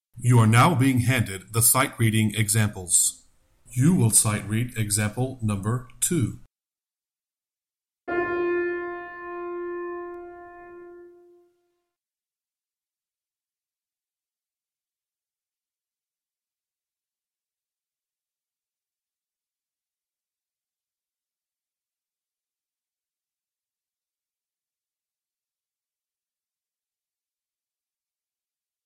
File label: Sightreading Example Alto